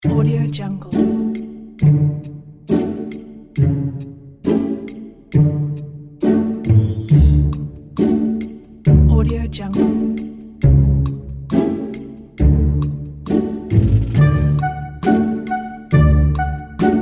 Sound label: carefree summer music